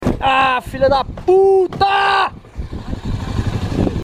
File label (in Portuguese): acidente tornado vs citroen aircross